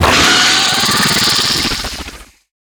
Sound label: Acid Barrage Shot; Lurker; Evolve; Gorgon